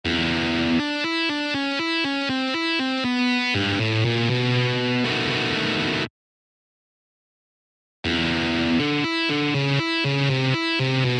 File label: Turnarounds in E